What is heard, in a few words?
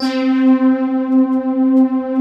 PADS; Pro-Rec - Synthsonic; TR PADS